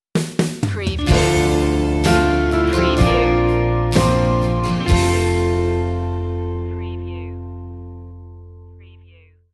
crashing waves stinger

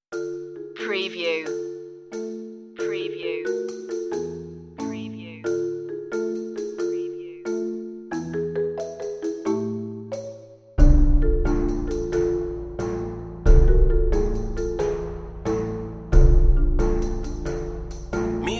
lightly macabre perc only

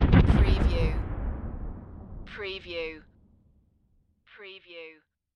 Explosion Blast Distant; Sound Effects Bible

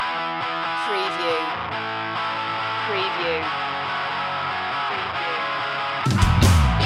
garage jam loop

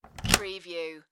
Deadbolt Unlock Door

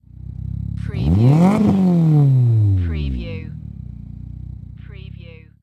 MITSUBISHI ECLIPSE EXHAUST SINGLE REV

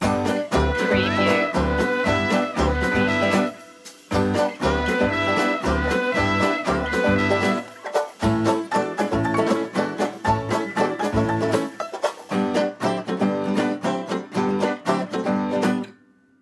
clown-o-rama loop

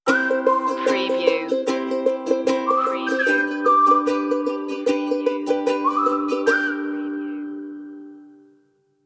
stinger

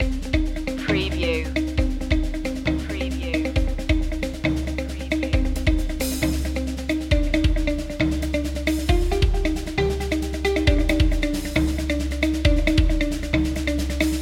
tones loop

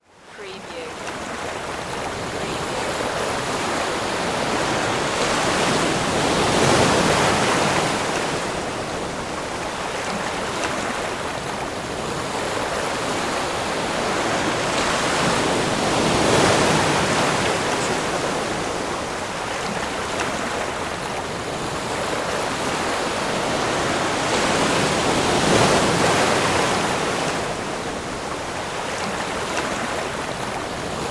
Ocean Waves Heavy

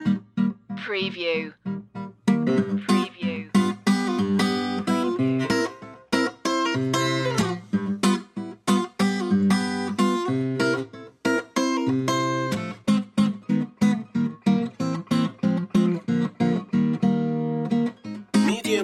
old town rag loop

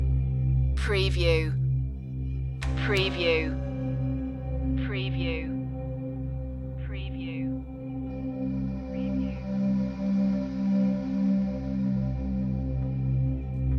african fields loop

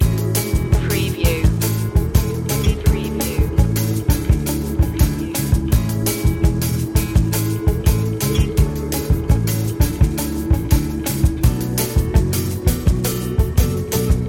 emotional brazil lounge loop

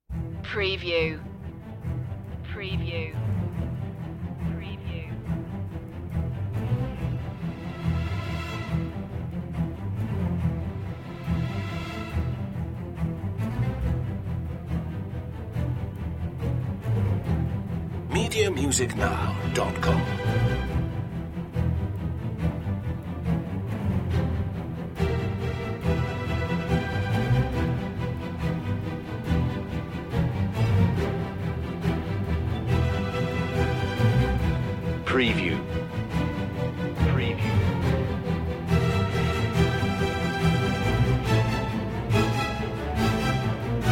strings only

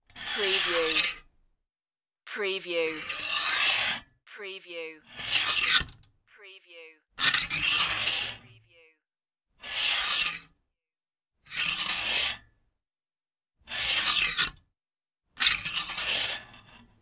telescope bar extending

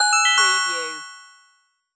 Multimedia Chime; sound-effects-bible

